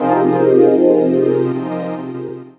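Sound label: Pad Synth; Equipped Music; Loungin House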